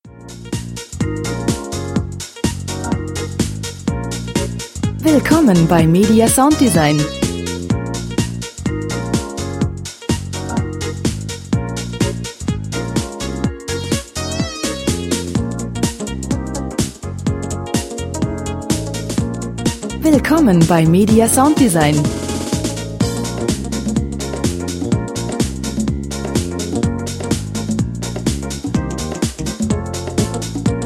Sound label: groovy